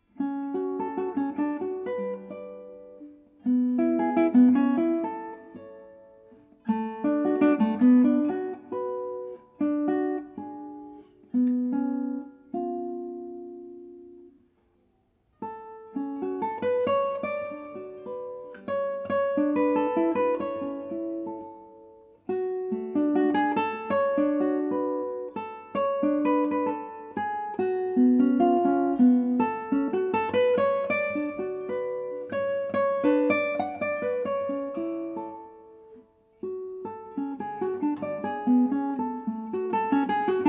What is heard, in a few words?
Grand Concert